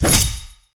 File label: lethal strike hit; hero mirage